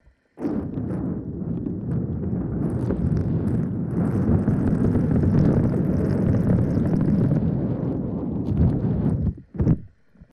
Condom

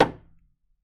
Piano Lid Close

